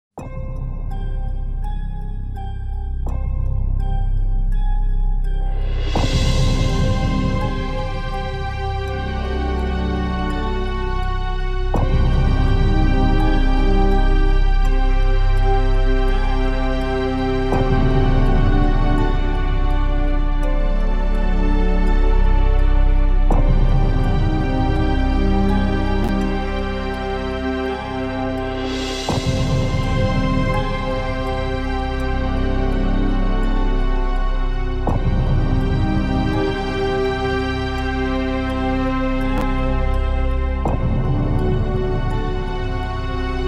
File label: Sorrowful Sigh